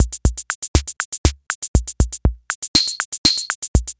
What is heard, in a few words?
Drum Groove